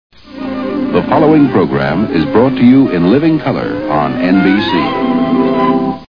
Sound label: peacock